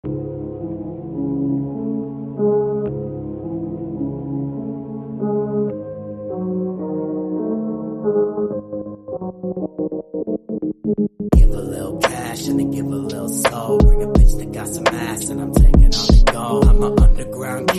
Remix, hip hop/rap

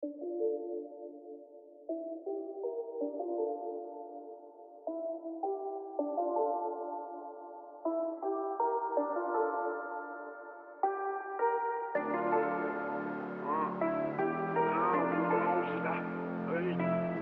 Remix

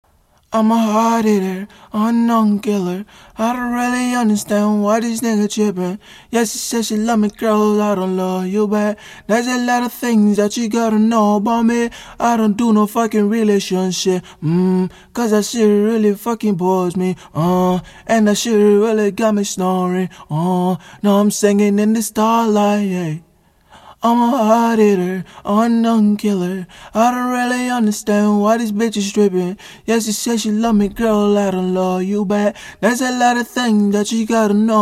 lo-fi 183 Abm; acapella

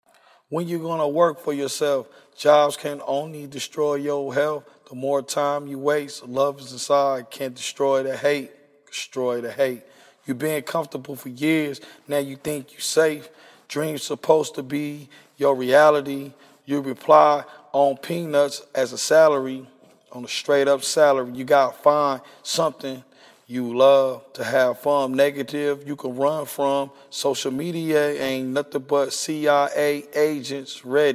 hip hop, 172, C sharp, Acapellas

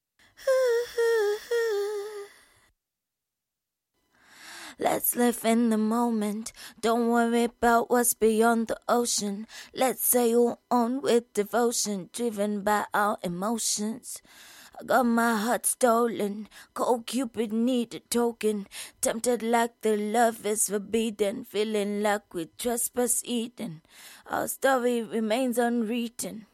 pop rnb 70 Bbm

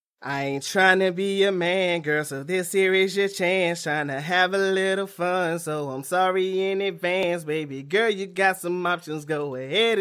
rnb 130 B; acapella

